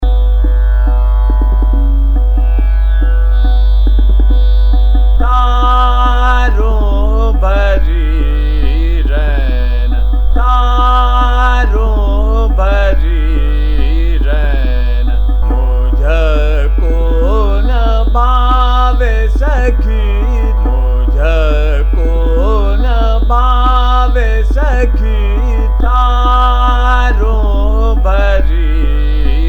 Chota Khyal - Ektaal Drut, Malkauns; Taron Bhari Rain

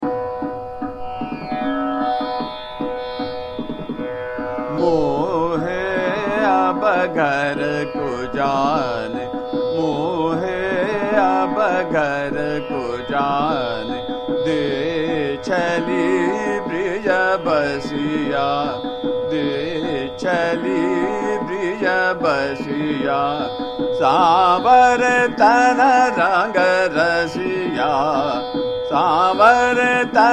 Chota Khyal, Ektaal Drut; Desi; Mohe Ab Ghar Ko Jane De

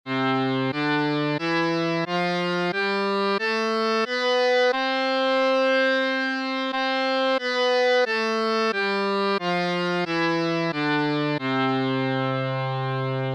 bilawal, thaat

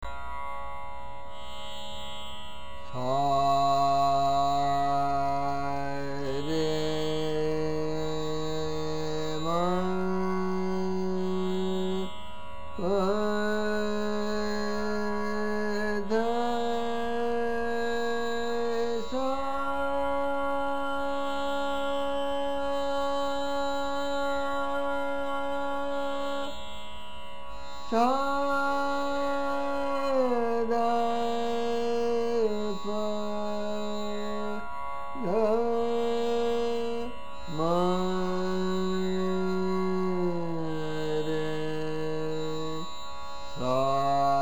Durga Aaroh Avroh

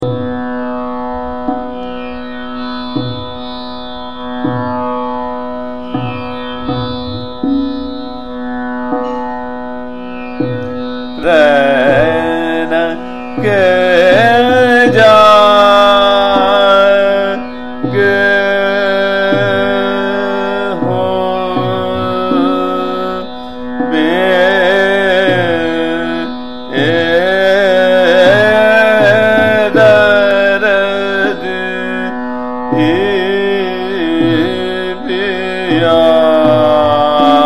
Bada Khyal, Jhaptal Vil; Jaunpuri; Rain Ke Jage Ho

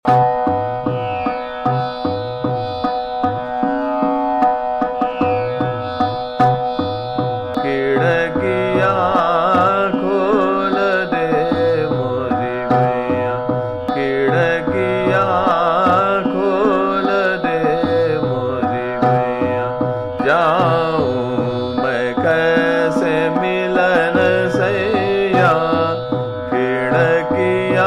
Chota Khyal, Trital; Jogiya; Khidkiyan Khol De

